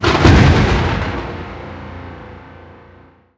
warcry; sven; signet

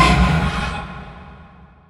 spell steal target; weapons; dota; rubick

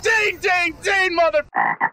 ding ding ding; soundboard

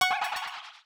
charge gained; dota; crownfall

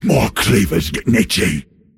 cast pain; dota; pudge

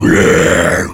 death satyr; dota; creep deaths

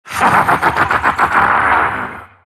silt laugh; dota; siltbreaker

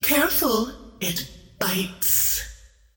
attack whip; queenofpain

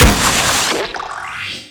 missile dud; tinker

